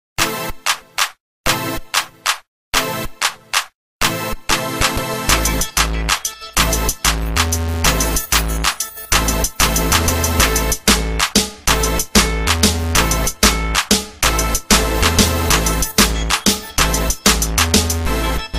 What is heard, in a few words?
reggae beat